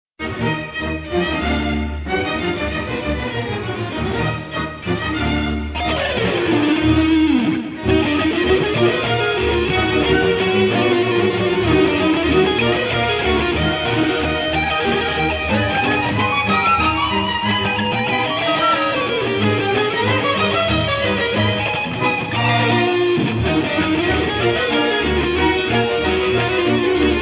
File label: fugue